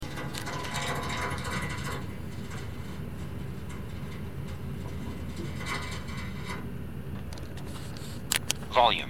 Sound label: Needle Gun